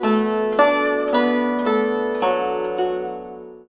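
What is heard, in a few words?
guzheng; Spectrasonics; Heart of Asia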